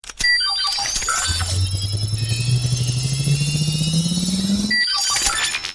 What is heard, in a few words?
scanning new DNA